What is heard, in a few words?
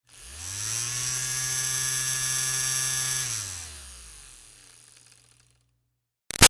Fan Small Handheld Run Short; Electronics